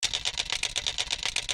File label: rifle cycle far